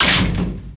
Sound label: door metal bars open; Max Payne